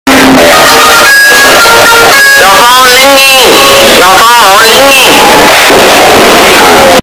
earrape; yo phonie linger

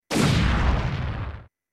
arwing hit obstacle; starfox

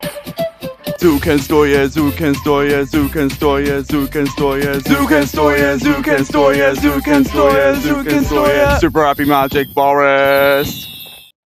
super happy magic forest theme song dutch

